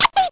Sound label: baby toy; Wired for Sound Pro CD; Aristosoft, Inc.